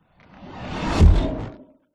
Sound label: fantasy magic spell zap whoosh cold ice crack impact